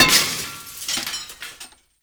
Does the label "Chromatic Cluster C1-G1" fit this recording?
No